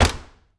Auto Nissan Altima Trunk Close; Transportation

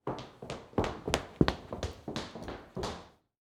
Foley Footstep Cowboy Boots Running By On Tile; Foley Footsteps

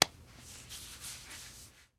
Impact Human Put On Aftershave; Impacts

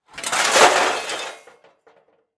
Crash Knock Over Metal Small Objects; crashes; AdobeCC

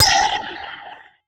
Transition Hit Impact Short; Adobe; Production Element

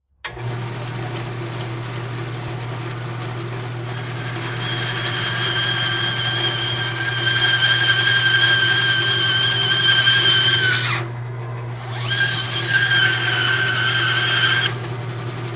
Industry Table Saw Run And Cut

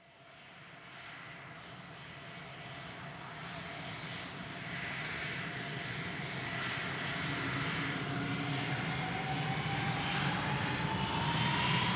Airplane Jet Test Engines; Transportation

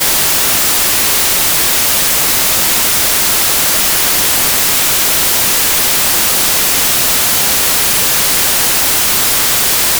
white noise

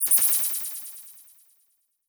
Title Transition Delay Short

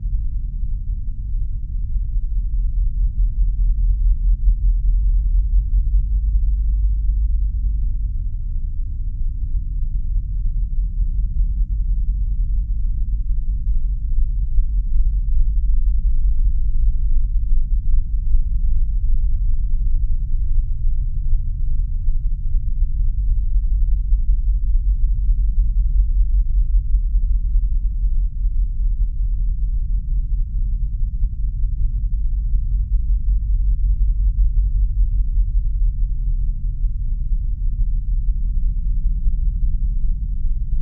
Drone Pounding Deep Low Tremor; Production Element Imaging; AdobeCC

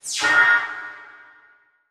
Transition Laser Horn; Production Element

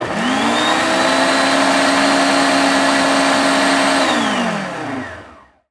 Household Blender Run Fast Then Turn Off

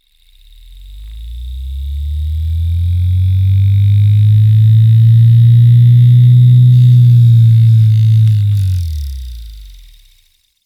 Industry Motor Servo Spinning Up Then Down

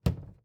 Household Door Close Entertainment Center

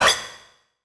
Transition Hit Impact Ping; Adobe; Production Element

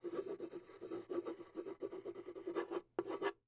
Household Pen Writing On Paper On Wood Table

